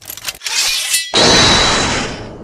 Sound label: point